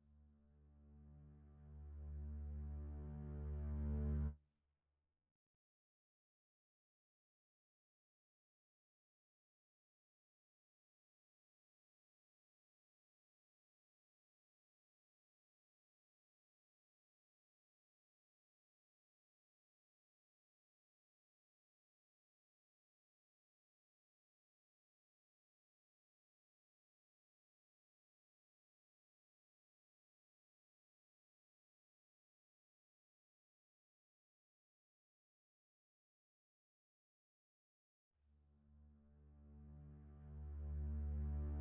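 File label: strings double bass, 87BPM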